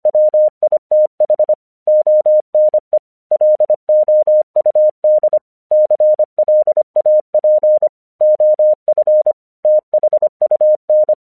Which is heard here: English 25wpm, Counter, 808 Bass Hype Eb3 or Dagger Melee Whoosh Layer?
English 25wpm